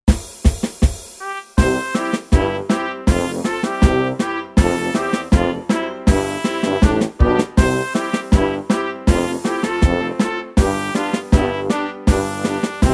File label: Karaoke